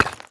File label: land brick; Aragami